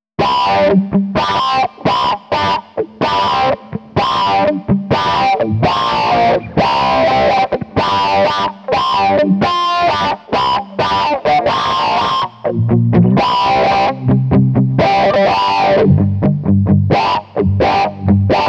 Auto Quack Comp off Crunch Rhythm

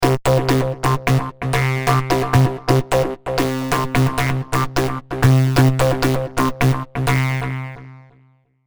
blue Seq FX

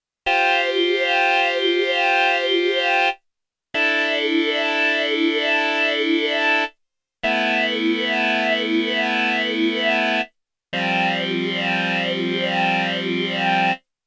STREICHFETT

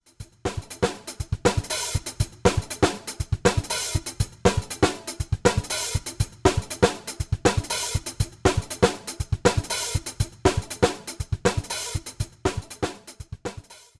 ROLAND M480 CE 1 Chorus

